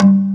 WOOD DRUM; Pro-Rec - Synthsonic; TRINITY

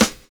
DISCO SD, SNAR; USB Soundscan vol.11; AKAI

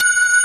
TABLURA

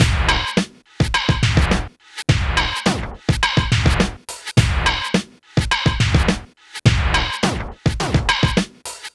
PHATT BEA, Hip Hop

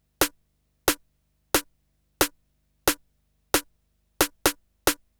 Snare Loop; Sonic Foundry (Sony Creative Software) - Fluid Dynamics Drum & Bass; Construction Kit

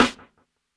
SNARE, DRUM N BASS:JUNGLE BEATS

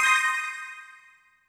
C5, Synth Leads; 90 s Sample CDs; NiceBeats (NiceBytes); Studioline 09

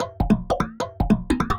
former snares; M8; percussion and foley

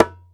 Ashiko Pop; EMU Planet-Earth

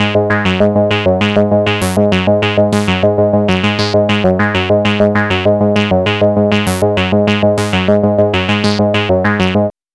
OBIE, DRONES & PADS

